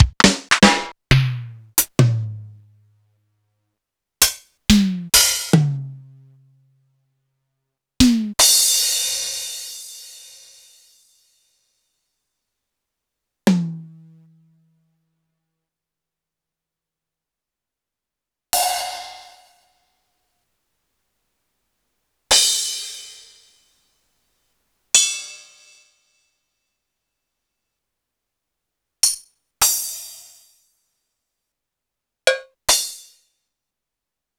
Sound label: Kawai K4